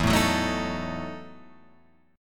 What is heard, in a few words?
E Major 7th Suspended 2nd Suspended 4th chord, Guitar Open F; EM7sus2sus4 strummed